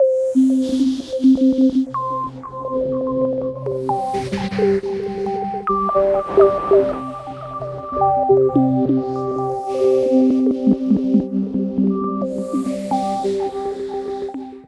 texture gentle glitch C D G, One Shot Textures; Transmission-X

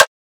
drum rim clicky shot; Virtual Riot; Drum Hits

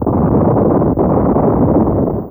Bomb, Fairlight CMI Effects

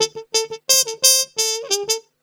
ACID JAZZ

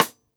Rim; Roland MV8800

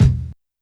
BD Break; YAMAHA RM1X

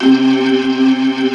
C3, Keyboards Old School; USB Soundscan vol.09; AKAI; BIG HAMM

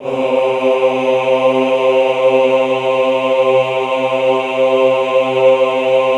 AHH C2, Classical Choir; Best Service; Extended Classical Choir; AHH MIX

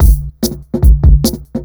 FILTER, Big Beat Drumloops; FILTERS; AKAI

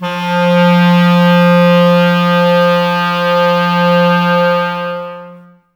wind clarinet, Clarinets, Wood Winds; Miroslav Vitous - Woodwind Brass Ensemble; 90's Sample CDs

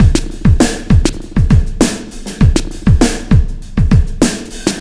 Beat, Loops

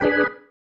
Stab One Shots, Organ

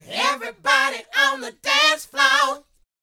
A#D, Vocal, DANCE 120; ILIO; Vocal Planet VOL-4; R&B & Dance

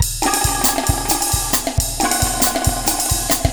Lounge Drumz

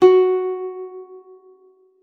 F#4, guitar; Xsample VOL-2

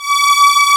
STR LUSH, MIDI Section; Roland L-CD702; Combos 2

